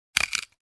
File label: Pepper Shaker